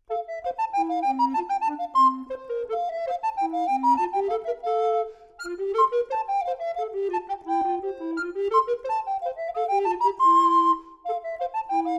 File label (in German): Sopran Tenor Solo; Waldorf